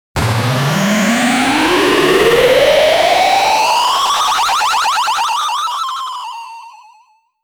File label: zombie shooter games level up